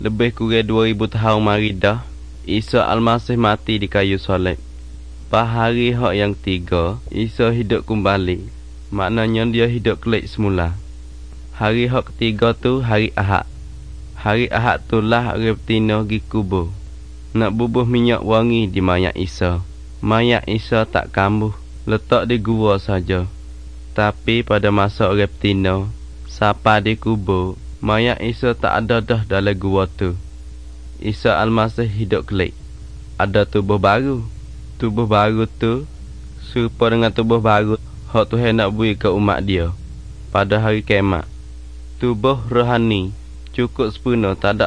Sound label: songs malay pattani